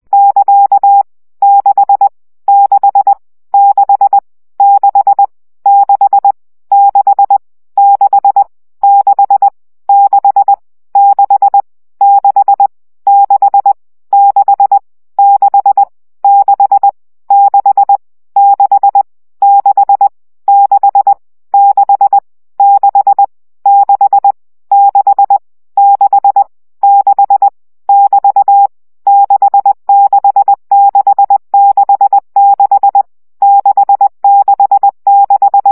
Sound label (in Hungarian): CW gyakorlas 1 17 baud